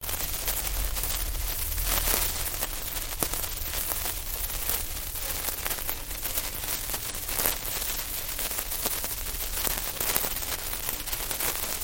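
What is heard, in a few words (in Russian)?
звук бенгальского огня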